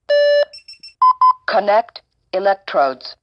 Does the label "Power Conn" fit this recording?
Yes